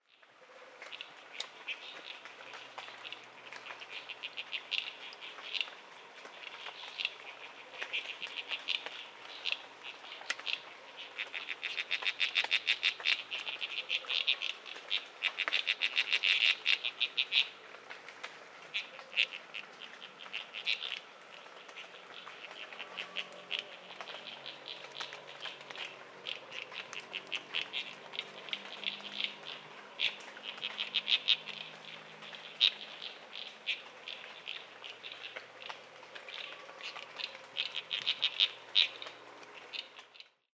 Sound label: Scinax obtriangulatus